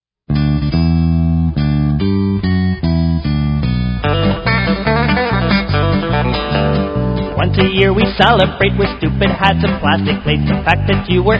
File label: The Happy Happy Birthday Song